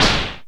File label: ANASNARE; Back In Time Records; Big Bang; SNARES